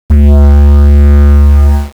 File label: shield